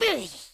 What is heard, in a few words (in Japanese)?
攻撃